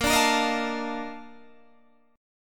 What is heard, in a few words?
Bb Major 11th Bb down Guitar Standard; strummed